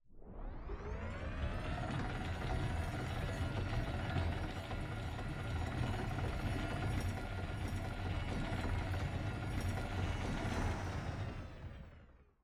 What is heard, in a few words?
Wheel spin